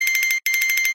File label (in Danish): nokia aflukke